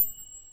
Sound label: VIBES BELLS